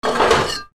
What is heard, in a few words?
machine scream